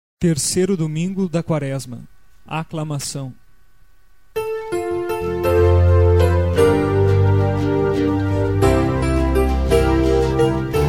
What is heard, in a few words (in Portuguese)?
Aclamacao Gloria e Louvor a Vos, cantos; 3o Dom. Quaresma B